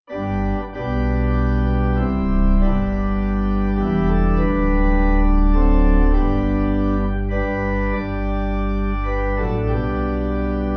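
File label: Pipe Organ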